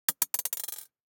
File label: DICE on Glass, Throw and Roll, Mini, 1 One Die; Samples; ArticulatedSounds; DICE Sfx Party (Extended)